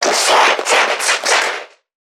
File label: Creatures Vocalisations Infected; soma; npc construct; Five Nights At Akers